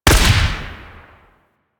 Quill Dart Gun Shot; Warframe

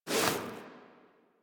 Walk Movement Foley

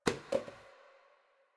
Janitor Paper Towel Drop; Warframe; Ambience; Nineteen Ninety Nine

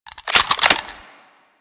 Clip Out, Weapons; Warframe; Tenno AR

